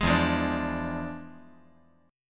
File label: E 7th Flat 9th, chord